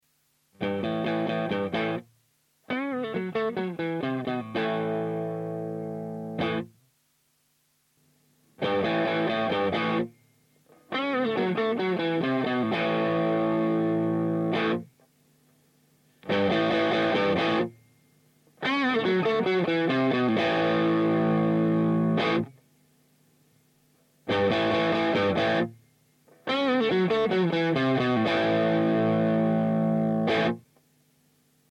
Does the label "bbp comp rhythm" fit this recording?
Yes